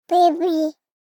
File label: BABIES TALK Boy 12 months Buhbee; Baby Boom